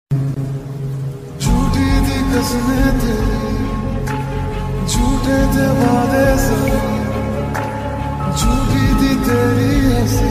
Sad Ringtone

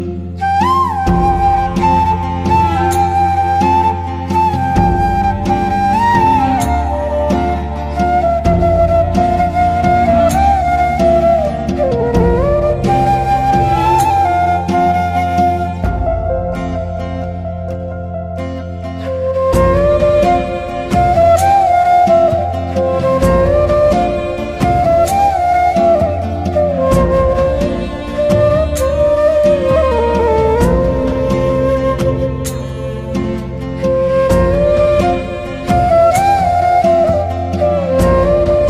Flute Ringtone

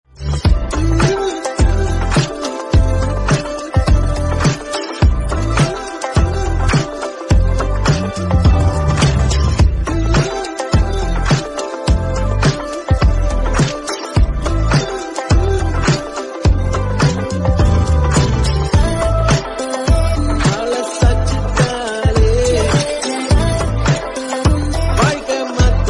Instrumental

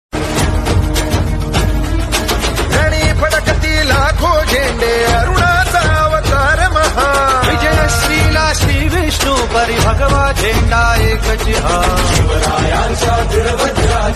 Marathi Ringtone; Chatrapati Shivaji Maharaj

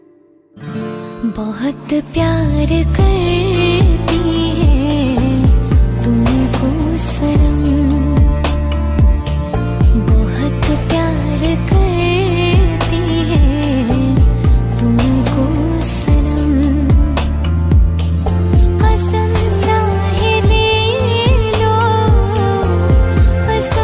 Female Version Ringtone

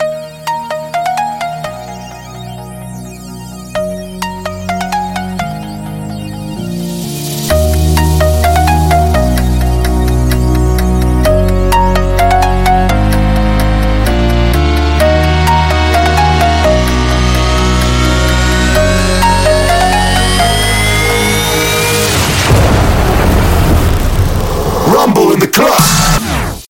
Alarm Ringtone

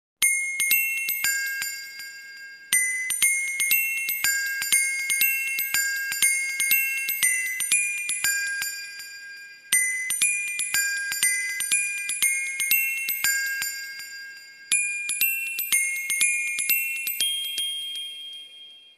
Morning Alarm Ringtone

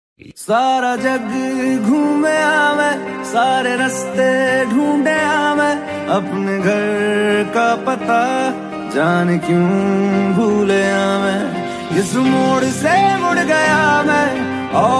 Sad Song Ringtone

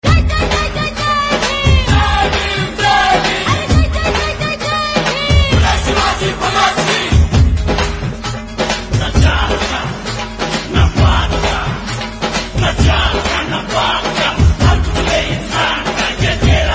Hindi Ringtone

